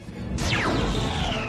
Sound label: wand; Expelliarmus